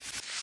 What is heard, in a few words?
collect card, baccarat; baccarat2